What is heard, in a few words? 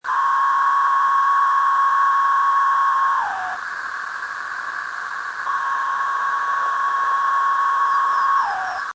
13-year cicada; Arthropoda